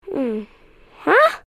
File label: Portal Teleport; the unfinished swan; Monroe GRDN